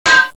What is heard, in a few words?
enemy hit